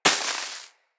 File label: Bullet Impact Debris Snow; Weapons